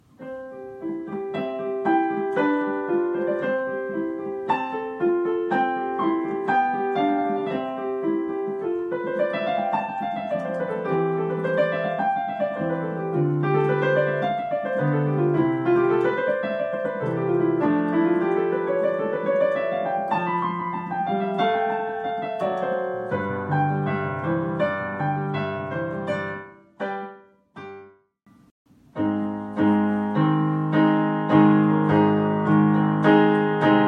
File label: Melody